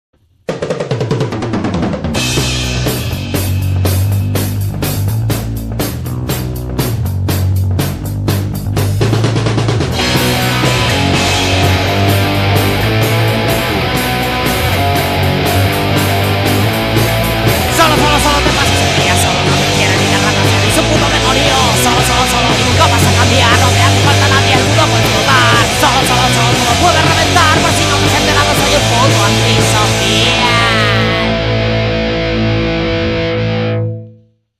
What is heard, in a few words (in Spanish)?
Bulerías